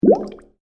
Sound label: Water Bubbles; Andrew Fleming